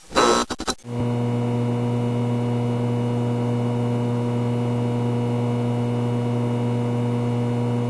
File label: loop broken light